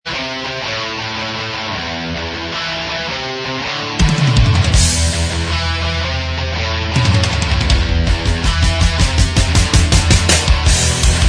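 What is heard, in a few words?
Instrumental Track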